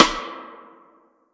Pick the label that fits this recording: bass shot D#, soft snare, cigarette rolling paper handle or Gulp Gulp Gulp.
soft snare